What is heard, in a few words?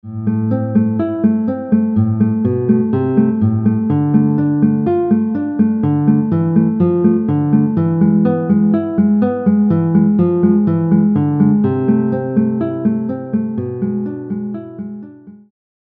guitar; Allegro; Giuliani; opus 50 no 13